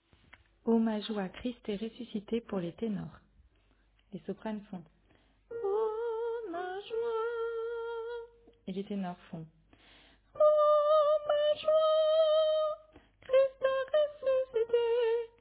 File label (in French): Tenor; St-Jean-Baptiste-de-la-Ste-Famille